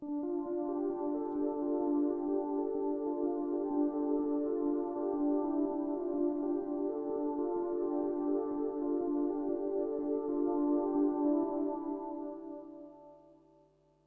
piano, ambient

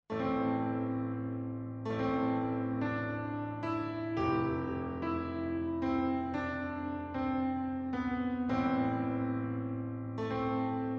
piano, ambient

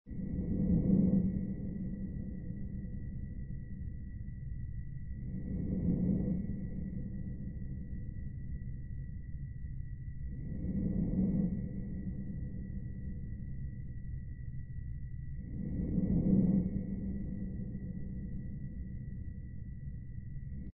breath, dark ambient

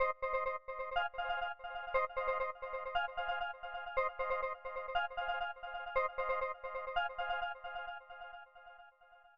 chime, ambient

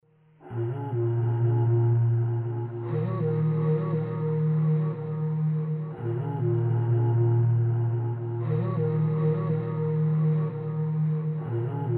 flute, ambient

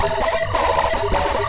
guitar, ambient

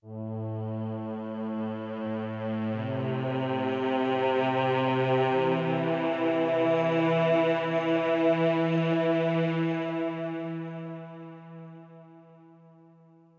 violin, ambient

